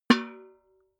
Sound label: metal bucket hit ring soft